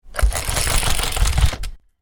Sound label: Roller blind down, Roller Blind: Domestic, Roll Down With Cord; Miscellaneous Household; Stuart Duffield